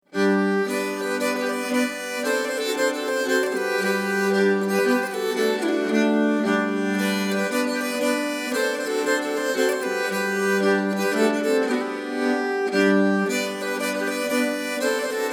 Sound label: polska, nyckelharpa